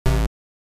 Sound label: bad move